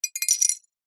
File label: foley bottle beer lid cap metal drop on concrete